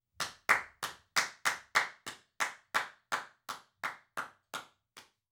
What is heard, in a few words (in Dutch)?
handen klappen